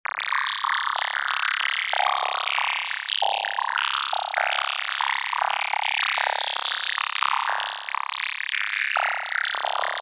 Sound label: grain